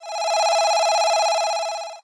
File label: Train Alarm; blue archive